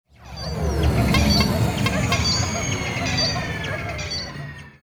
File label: ship entry; steamcommunity; saliengame